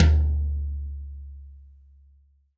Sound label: TD-20 Tom A, Roland TD-20 Toms